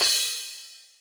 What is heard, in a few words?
Akai XR-20, Drum Machines, Cymbals